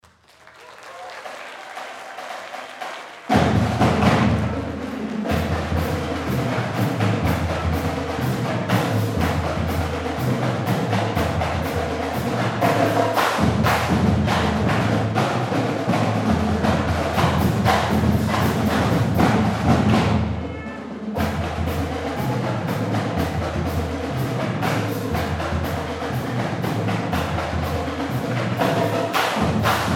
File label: Drumline Encore